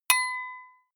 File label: medieval notification popup